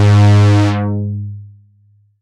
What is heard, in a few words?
G#2, Analog Synths; Ableton Foundation FreePacks